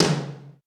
Roland U220 Dry Set

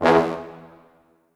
E1 L, Orchestral Brass; Best Service ProSamples vol.20; AKAI

